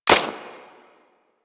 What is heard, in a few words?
Fire Mech, Weapons; Lato Redux